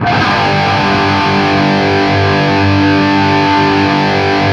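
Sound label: power G loop, guitar power chord loop; East West; Steve Stevens Guitar Samples Collection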